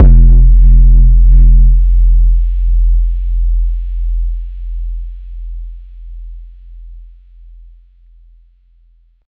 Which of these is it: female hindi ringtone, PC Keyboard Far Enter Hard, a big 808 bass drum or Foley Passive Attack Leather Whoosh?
a big 808 bass drum